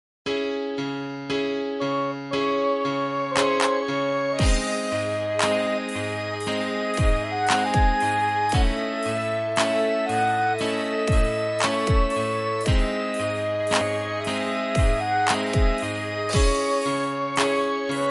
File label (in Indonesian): nada dering keren bgm instrumental islamic music